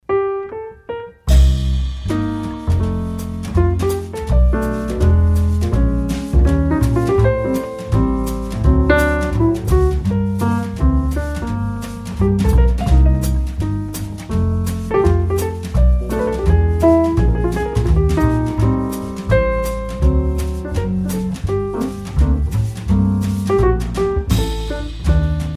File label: piano jazz; Классические